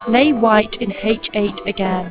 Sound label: music DLi